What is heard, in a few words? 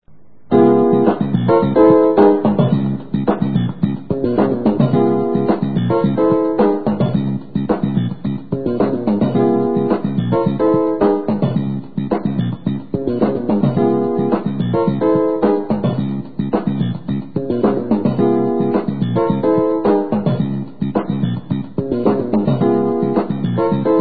Riff, Piano; Piano diary 2000